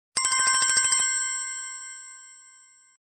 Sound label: notification